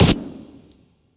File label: Sniper Shot